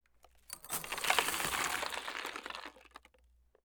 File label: Sand Pebbles; TOOLKIT vol.2